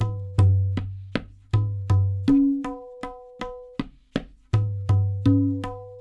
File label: LOOPS, Percussionworks